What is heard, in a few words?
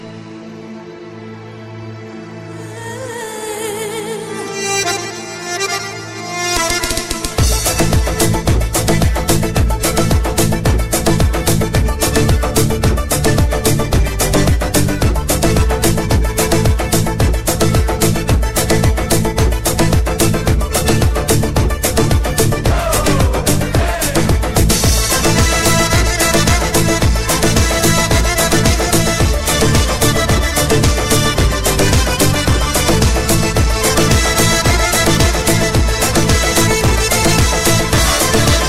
lezginka; kavkaz